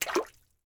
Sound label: Water Splash; UltimateFishing2020